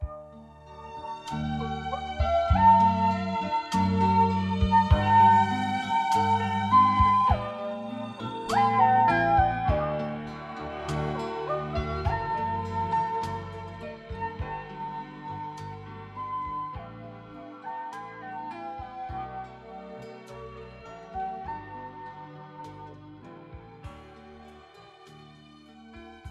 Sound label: Strings Pad